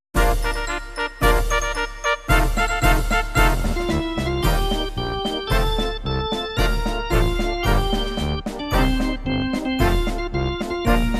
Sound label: mars no vocal